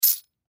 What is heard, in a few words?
Tambourine Wood; Shake Single; Rattle; Musical; Blastwave